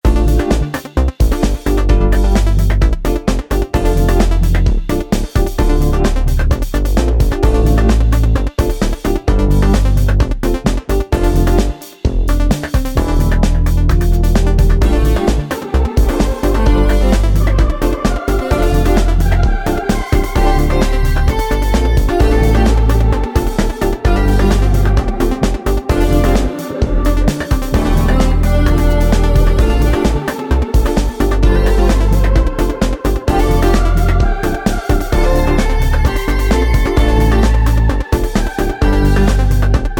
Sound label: Pause Menu; Friday Night Funkin' Taco Bell Tuesday